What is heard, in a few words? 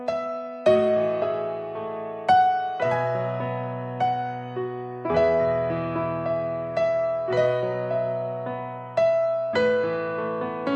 titanic soft music